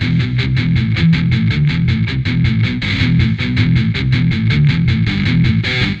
E Guitar; сэмпл гитары; звуки для фильмов

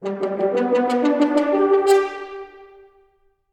Horn Bit

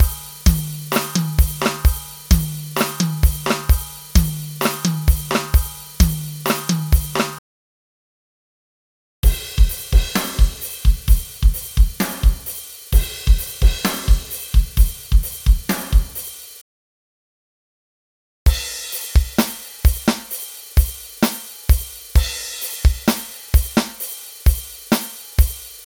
Grunge Drums 130 BPM; Ударные (Drum Loops)

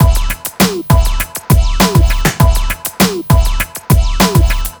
Bigpop, ударных rock pop; сэмплы ударных

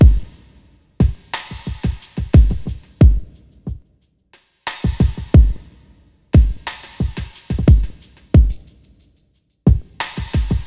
Industrial D, chillout

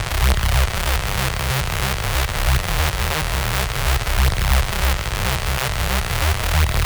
Tech Lead C, техно-транс

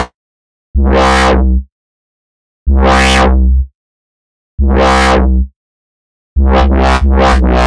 Jelly Bass, Break Beat

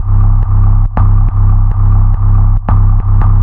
Air bass; Бас сэмпл в стиле Hardstyle; Bass Loop; 140BPM

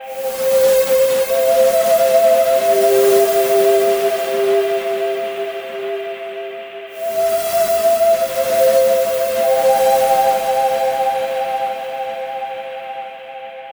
Dust planet

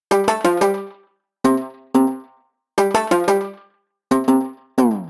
World Music Guit; Hip-Hop

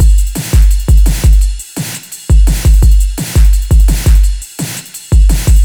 Sustain Beat, 170 bpm

